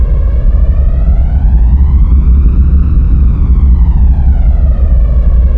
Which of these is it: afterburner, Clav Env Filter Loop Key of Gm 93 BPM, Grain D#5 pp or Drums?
afterburner